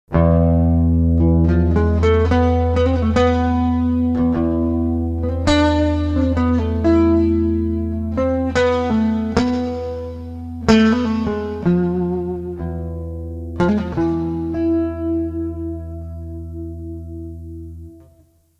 Classical Guitar

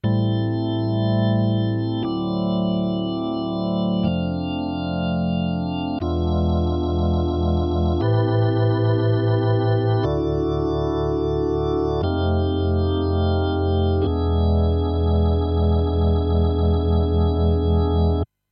E Organ Rotary Speaker

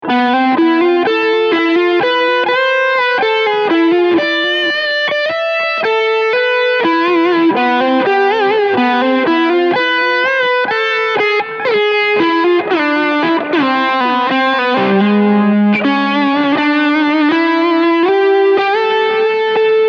DIST Lead Delay

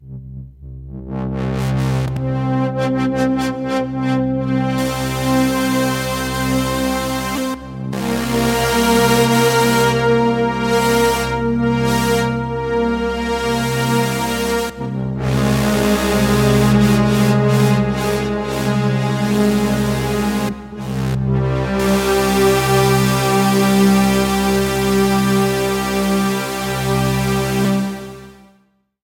Synth Pad

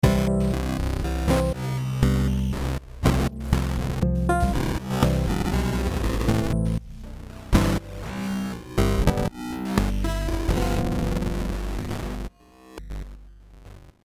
Emotional Piano Dist; Pigments; COLDFIRE; Datarot

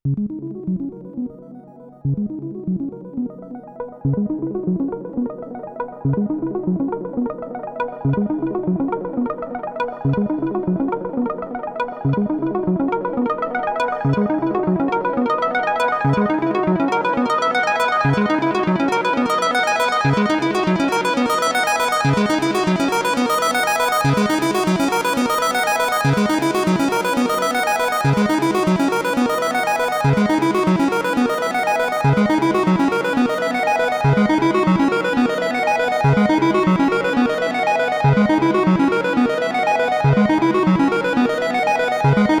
Synthesizers Reverb Delay; Grp Synthesizers A1; Showcase

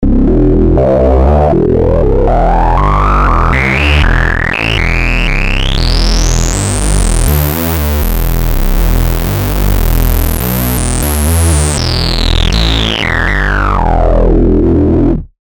Evil Bass

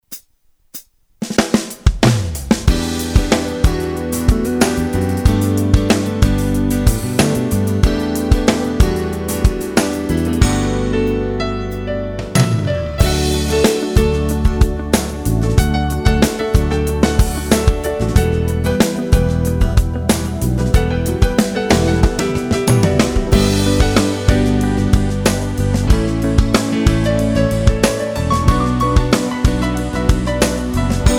Acoustic Pop

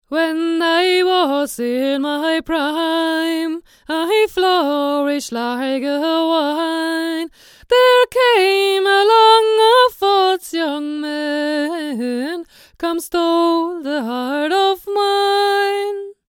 AKG C414 H1 near

